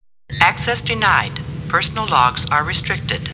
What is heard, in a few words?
access denied sms ringtone